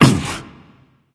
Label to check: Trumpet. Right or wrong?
Wrong